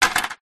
household freezer ice cubes x2 drop into plastic freezer tray